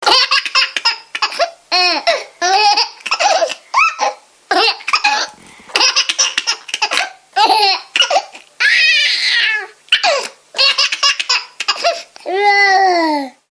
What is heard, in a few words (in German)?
Babylachen klingelton